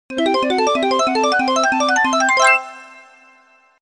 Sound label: Recharge; Dingpot; Banjo-Tooie